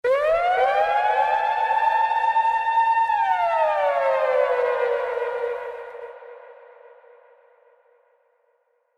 siren hall

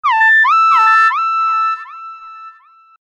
syn am

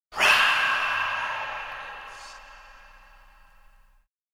rise

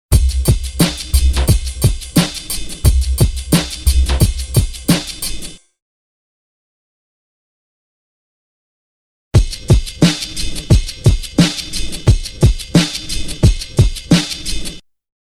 Drum loop; BEST OF DRUM BREAKS 30

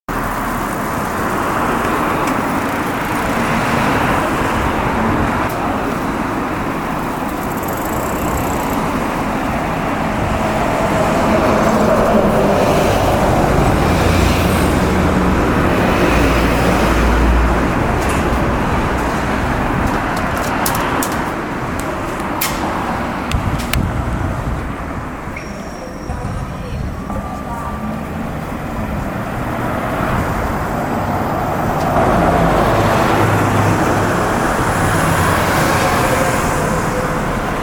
Car passing sound

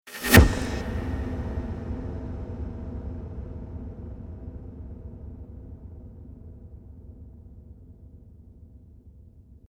shock drop

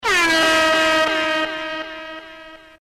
Reggae Horn

